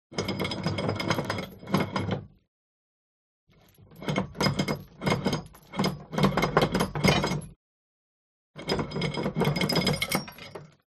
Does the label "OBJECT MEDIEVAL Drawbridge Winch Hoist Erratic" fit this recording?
Yes